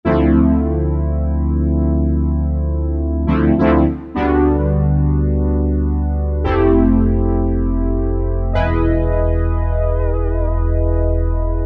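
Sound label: brass CS80, Yamaha TG500; Internal Voice I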